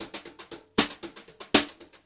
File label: HOTROD VARI, Brushed Drum Loops; USB Soundscan vol.39; AKAI